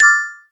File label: ding; butterflysoup2